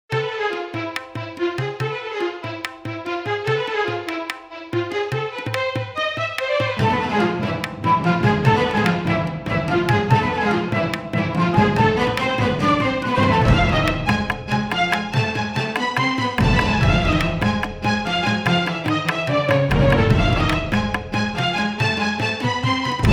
Indian Orch

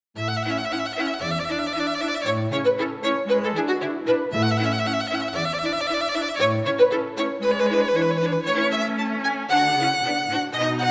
String Quartet

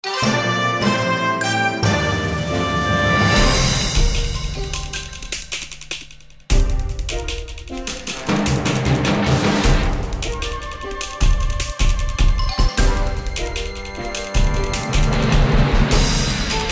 News Theme

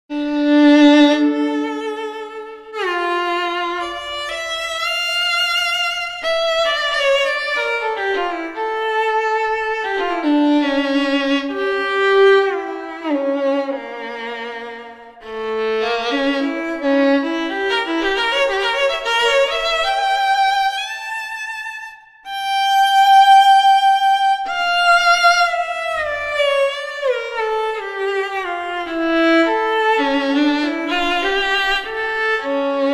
Gypsy Violin

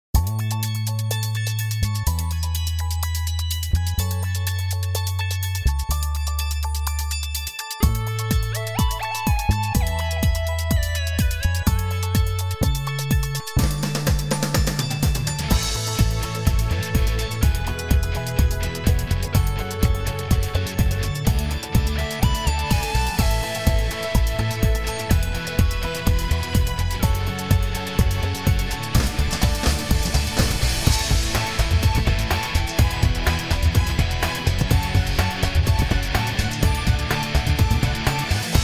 Pop Track

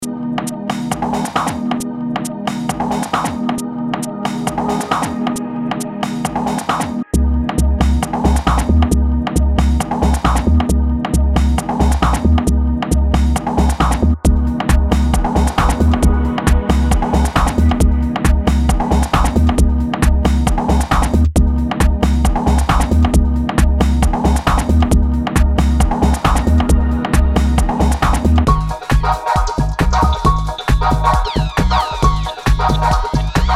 electronica

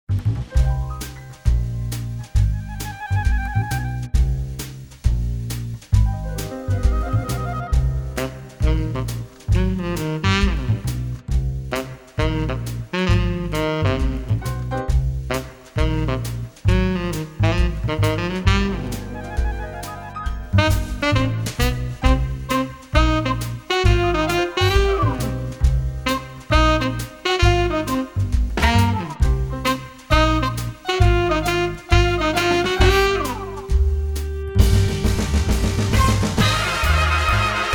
Retro Tv Theme

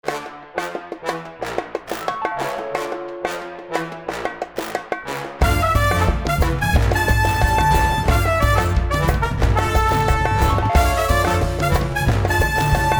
Retro TV Theme International Spy

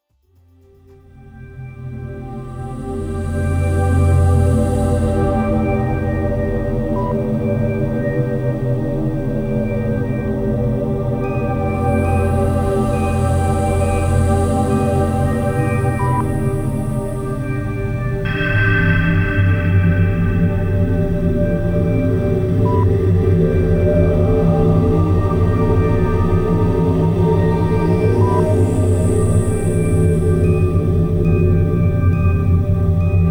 ambient space

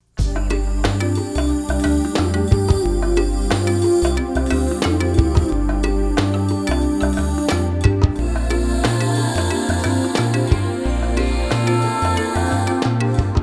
pop track vocals

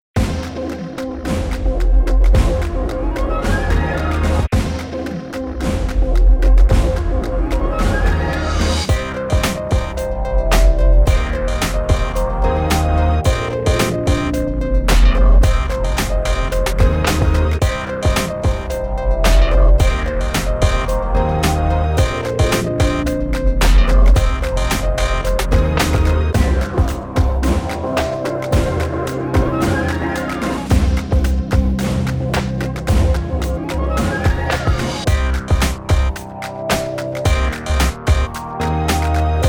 pop track

